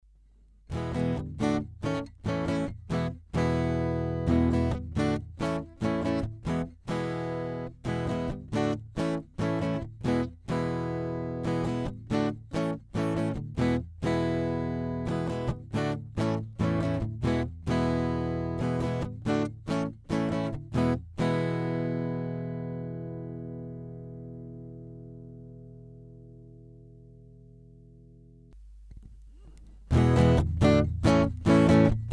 Akustikgitarre; sE DM3

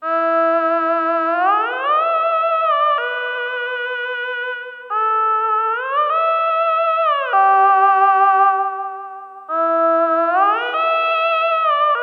Teisco S100P VOICE mit Reverb

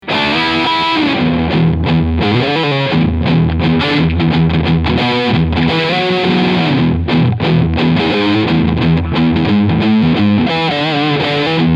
Fusion II HH Overdrive Steg Pickup; Roasted SSP

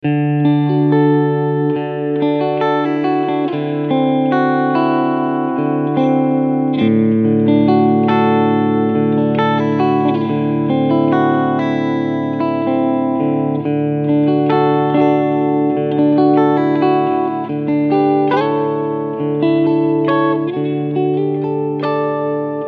Cleansound beide PUs

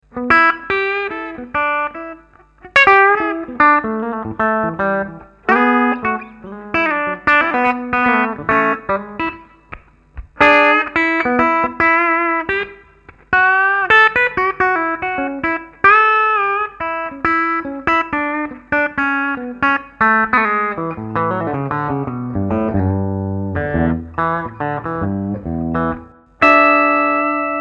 steg clean

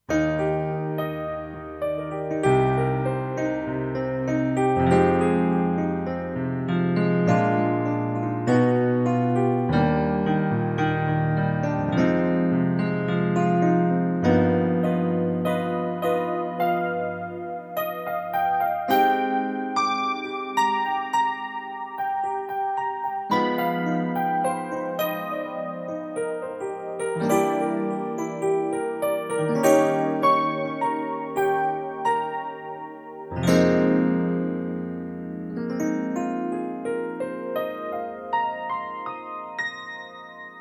FM EP und CFX Grand

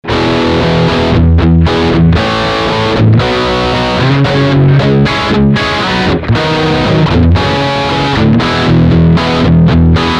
Riff Steg Pickup Distortion

